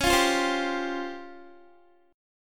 C sharp-Major 7th Flat 5th-C sharp-20,20,17,17,19,x-1-down-Guitar; C#M7b5 strummed; JGuitar